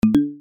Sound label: new item